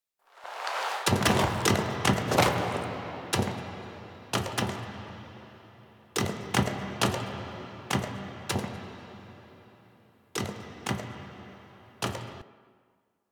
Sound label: Steps E, Throne Room; The New War; Sentient; Ballas